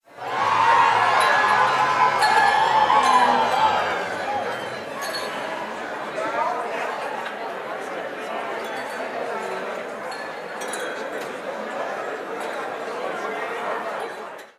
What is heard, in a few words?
Crowd Loop; Se Solo Crowd Loop